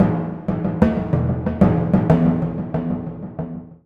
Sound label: Timp a